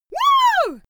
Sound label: female cheer woo; schreie; menschen